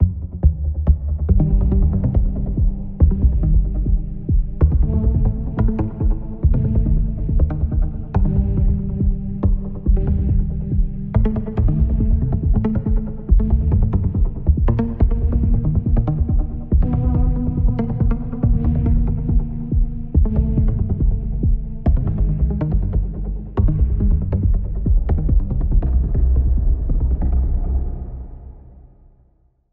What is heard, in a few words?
Steady Progress